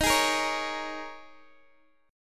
Eb 7th Suspended 4th Eb, Guitar Open G; x,x,13,13,11,11; down

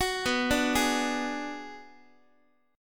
B-Minor 6th B down Guitar; Bm6 arpeggiated; x,x,9,9,6,7; JGuitar

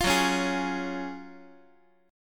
Gb Major 7th Suspended 2nd, Guitar; strummed; x,x,8,4,5,7; JGuitar

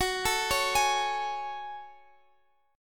F sharp Minor Add 9th F sharp; arpeggiated

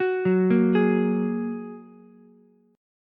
F sharp Major Add 9th, Ukulele; arpeggiated; 11,6,6,11

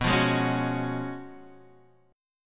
F sharp Major 7th Suspended 2nd Suspended 4th B

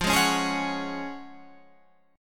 F sharp Major 7th Suspended 2nd F

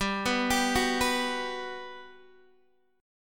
G Major 7th, G, down, Guitar, DADGAD

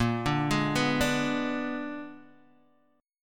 Bb 7th Flat 9th Bb, Guitar Standard; Bb7b9; arpeggiated; 6,5,6,4,3,x; JGuitar

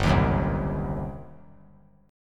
G Major 7th Suspended 4th Sharp 5th, G, down; GM7sus4#5 strummed

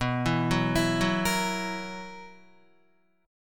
B Major 7th Suspended 4th, Guitar; arpeggiated; 6,7,6,9,6,6; 4F,4A,5C,5G,5C,6E; JGuitar

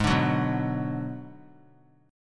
Ab Minor 7th Flat 5th Ab, down, 3C, 3F, 3A, 3D; strummed; JGuitar

